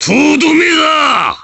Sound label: gallitgun